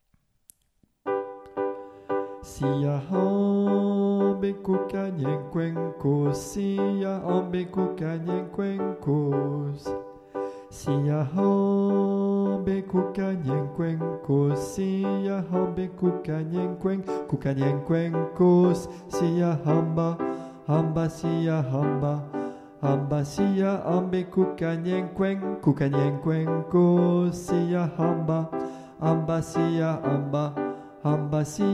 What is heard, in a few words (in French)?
siyahanba alto, chants; siyahamba (alto)